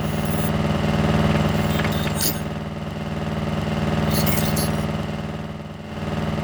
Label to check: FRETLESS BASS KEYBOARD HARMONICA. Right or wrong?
Wrong